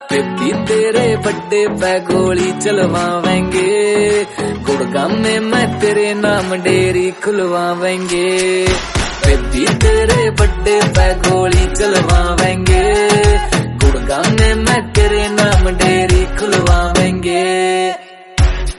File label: Birthday Haryanvi Song